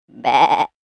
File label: Sheep - Animal Impersonation; Sheep Sound Effects